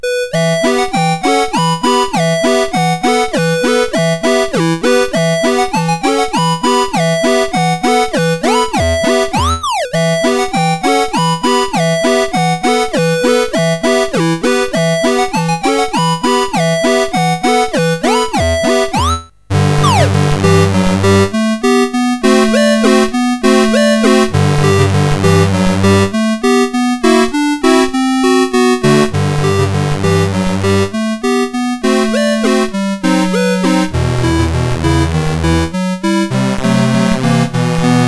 Albumleaf, CSG8580R5

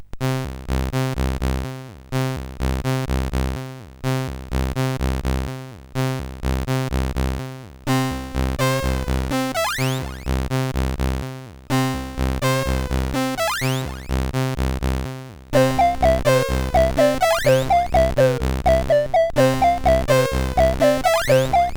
sid, CSG8580R5; Crazy Blaster

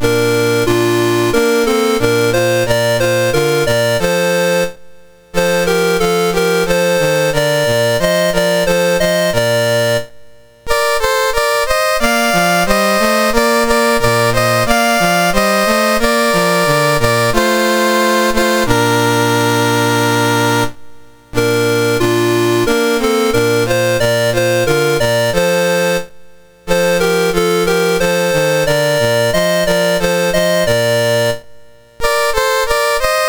MOS6581R2, National Anthems; Lesotho

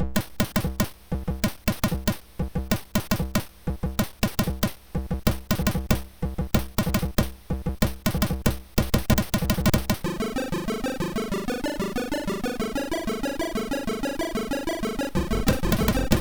happy hardcore, MOS6581; Knoeki; MOS6581R2

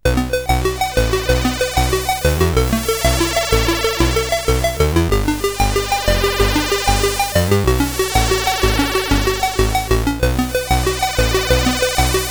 sid CSG8580R5

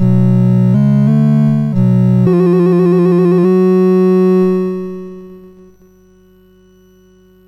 sid, MOS6581R2; Donkey Kong 1983

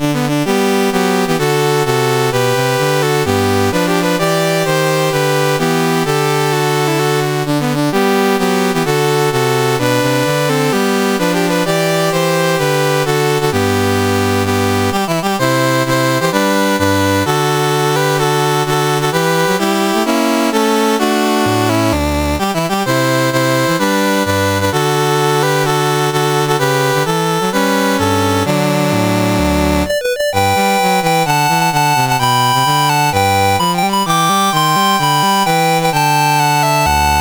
Andorra National Anthems, CSG8580R5

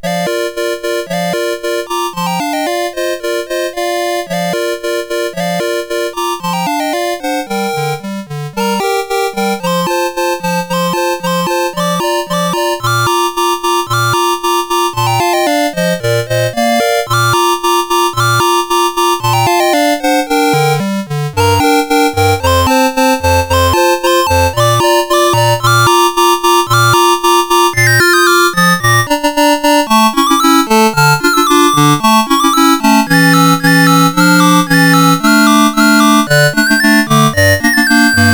Turkish March, CSG8580R5

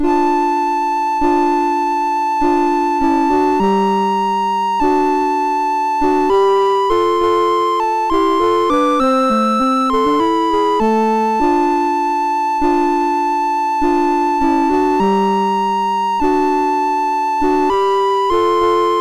MOS6581; MOS6581R3

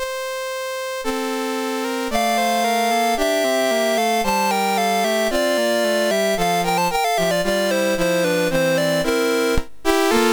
concerto for clarinet, mus MOS6581R3

